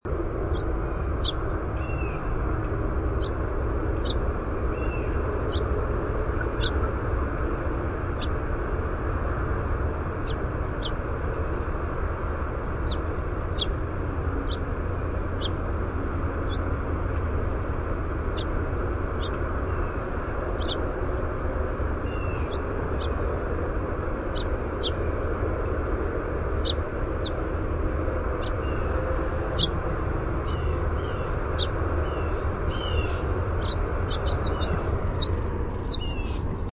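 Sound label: call, House Finch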